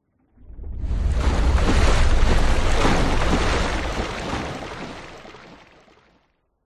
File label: water whoosh impacts movements; george gazetis